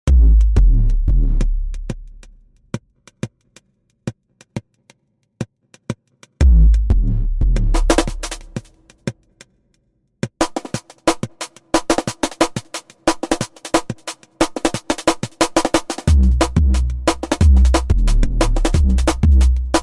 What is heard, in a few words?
Dark Step